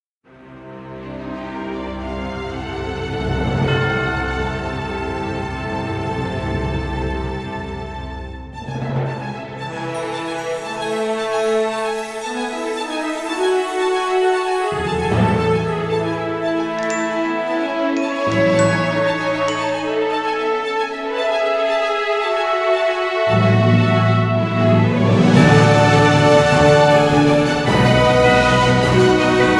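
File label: background music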